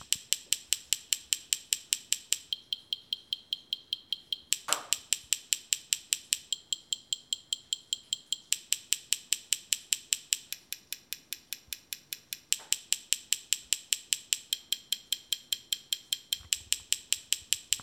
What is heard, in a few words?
piezos clicks paper